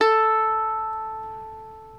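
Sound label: reference pitch mandolin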